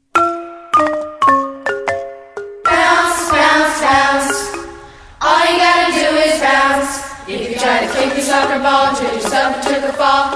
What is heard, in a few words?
Bounce Bounce Bounce Song